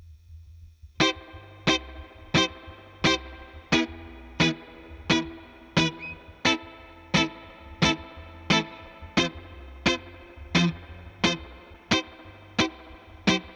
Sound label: Guitar Clean